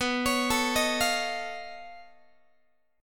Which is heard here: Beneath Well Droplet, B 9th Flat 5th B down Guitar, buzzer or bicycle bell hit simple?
B 9th Flat 5th B down Guitar